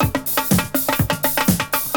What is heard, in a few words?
bratata; Best Service; Hyper Dance; CONSTRUCT